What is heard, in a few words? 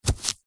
sponge brush wet paint single dab on paper; zapsplat